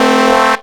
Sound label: Synths; Vengeance Samples